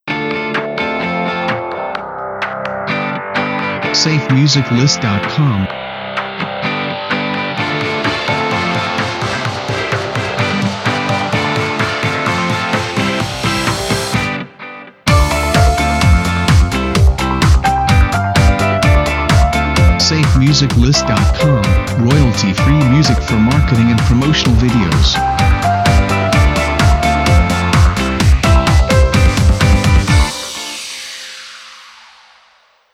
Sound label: Pulse; SafeMusicList